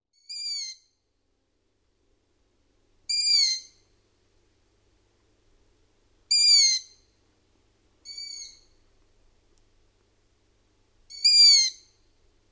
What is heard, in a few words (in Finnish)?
TORNIPOLLON POIKASET; LINTUAANITYKSET